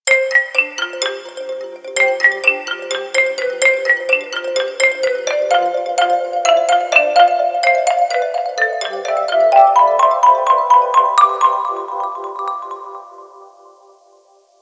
Grass Meadow, ringtones; Motorola MOTOLUXE-XT615

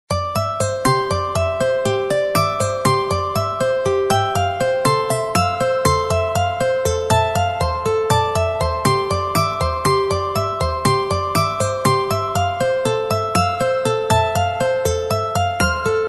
Guitarus; Alcatel OT-708x

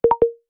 Usb Dock; Newman; CM810

